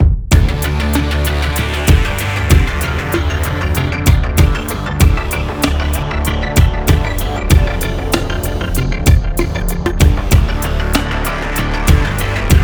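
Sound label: Music Electronic Pre Race 96bpm; Music Elec 2#5 (Pm Elec Pre Race 2 96bpm Game Mix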